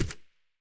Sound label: scar silencer mono far